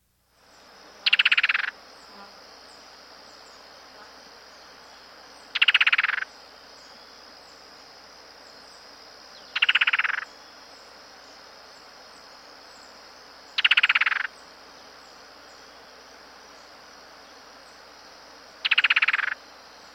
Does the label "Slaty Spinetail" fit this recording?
Yes